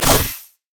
decimate; LoL; darius zaunknight

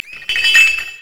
brazier chains

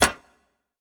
ui generic button release; LoL; hud

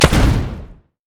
pirate basic attack; LoL; gangplank

